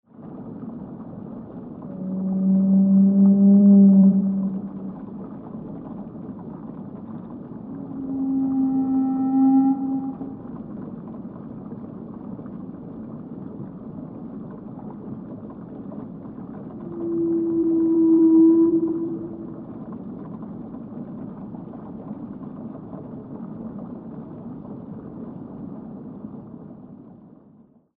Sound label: Whales